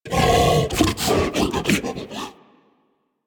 Juggernaut Truffle Found; Warframe; Infested; Truffle Hunt

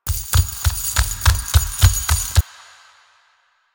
Run Loop; Warframe; Kalymos

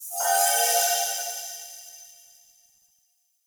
Light Mirror Beam Hit Positive; Puzzles

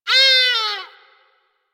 Forest Animal Cries; Warframe; Ambience; Grineer Forest

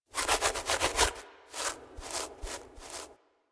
Puppy Foley Claim Confirm; Warframe; Kubrow

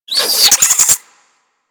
Infested Minion Pain; Warframe

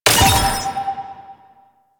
Scan Pillar Burst; DE; Warframe; Frumentarius; Scan Ability

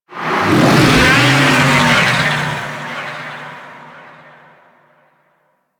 Teralyst Vocal Attack; Enemies; Warframe

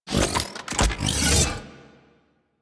Big Slash Equip; Crp Big Slash

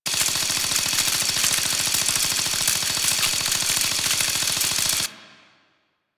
Belt Fed Rifle Fire Loop; Weapons; Warframe; Tenno; Frumentarius

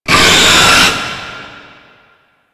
Breathe Out; Warframe; Stalker

